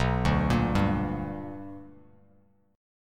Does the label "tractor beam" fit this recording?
No